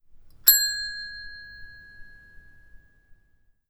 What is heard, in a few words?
ding; snds jack gmod